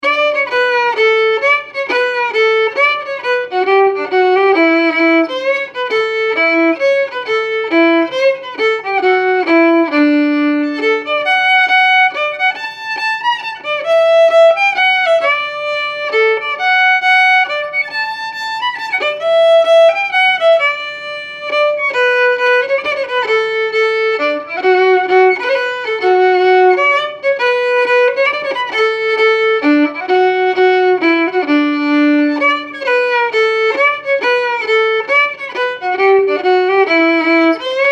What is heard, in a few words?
Mazurka; Lanky Long Legs